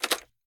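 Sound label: gear rattle weapon large; viper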